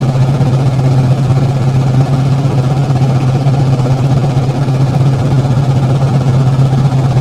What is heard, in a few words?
fan; Bad Piggies